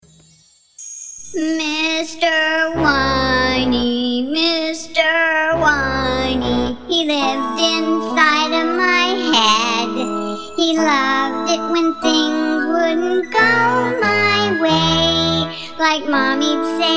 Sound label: song